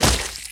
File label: Big Zombie Smash